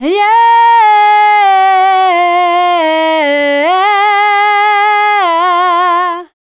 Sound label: FEMALE, Dance Vocals